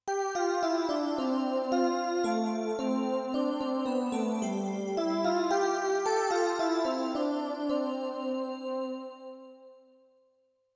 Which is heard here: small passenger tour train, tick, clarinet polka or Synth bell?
Synth bell